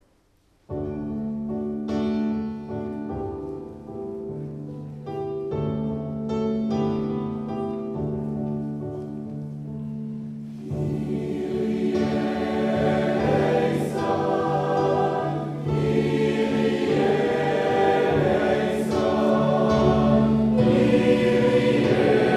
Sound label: Schola Kyrie